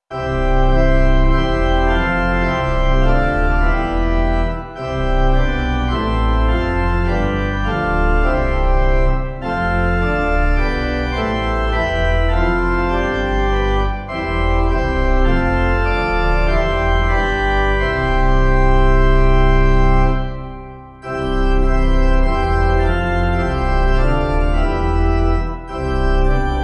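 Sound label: Pipe organ, church music backing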